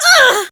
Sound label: Pain, Barks; Kuva Lich; Persona B